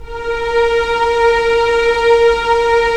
STR, Full Mutes; Roland - LA Composer vol. 4

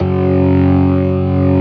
STOPS, ORGAN; Keith Emerson Signature Series

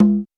Roland U220 Electric Set, Drum Machines

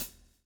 swing

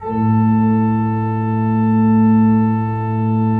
A1, Cathedral Organ, ITAL.PRIN.; Propeller Island

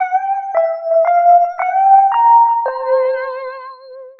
lead (spooky); xtra lead; Sonic Foundry (Sony Creative Software); Ugly Remnants VOL-2

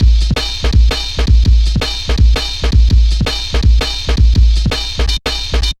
Klanga, drum loops 165.5; Jungle; Zero-G Jungle Warfare 1, 2 & 3; 90 s Sample CDs

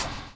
LOOP; X-Static Goldmine 2

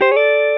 El Gtr Lick; Mo' Phatt; Digital Sound Factory Mo' Phatt

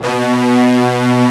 Section Brass B1; Proteus 2000; Digital Sound Factory Proteus 2000

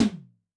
Hybrid, Tom, Yamaha DTXpress II

